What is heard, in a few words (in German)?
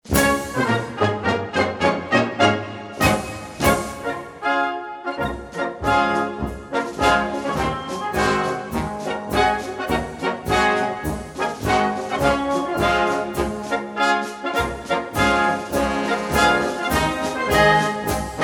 Marsch